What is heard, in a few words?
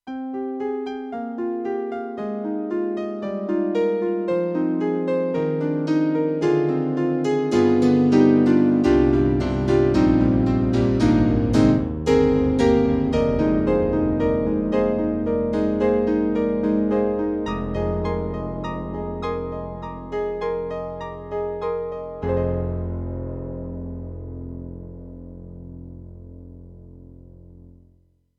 Roland JV-2080 Piano; Piano Thang